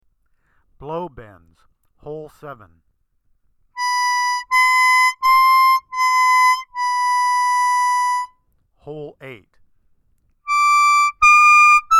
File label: Blow Bends